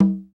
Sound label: Bongo B Hit; Hip Hop; 087 Emin; Hip Hop Exotica; Apple Loops; Big Fish Audio